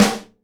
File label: SNARE DAMP, Live Snares